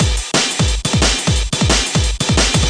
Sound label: 177 break, DnB Breaks; 141 to 185 bpm; ethex